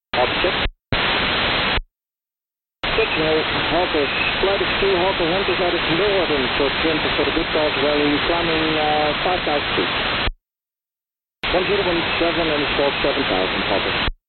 hawkers flyby at airport twente; ehtw

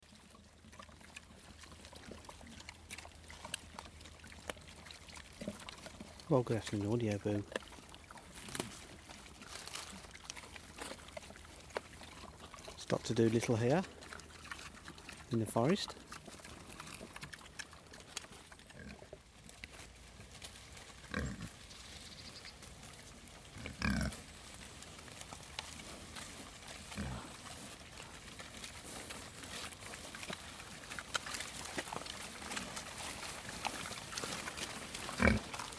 meeting the Wyre Forest pigs

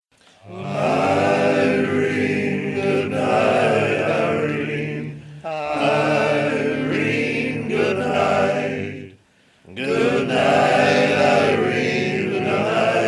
the fwestivus choir

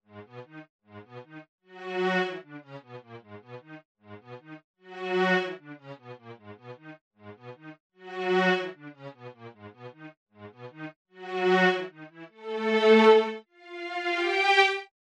dungeon loop

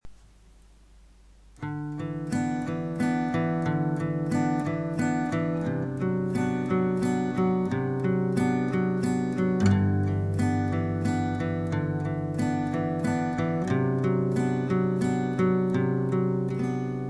little chord progression

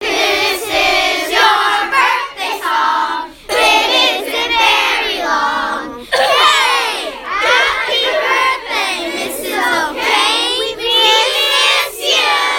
birthday song

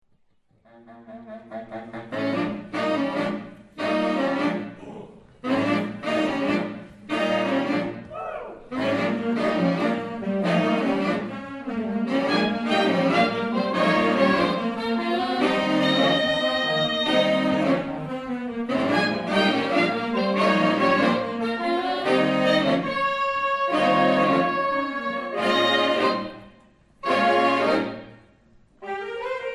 saxidentals

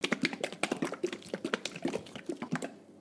shaking sound

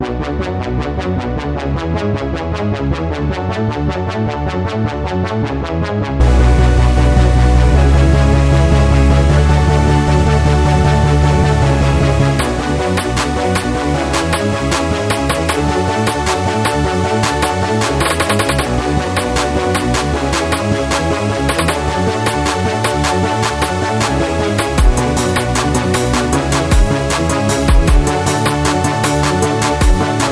beat hiphop

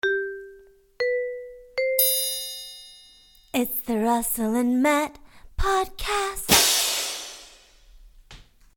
podcast jingle

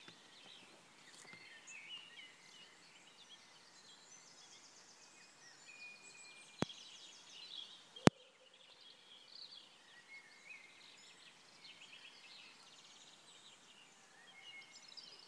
birdsong

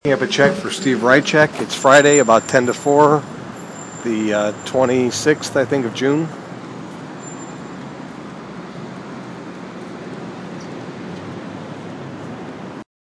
picking up check

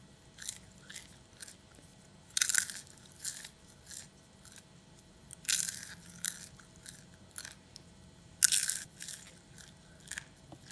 popcorn chew

